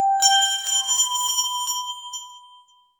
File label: gentle and soothing magic, glass harp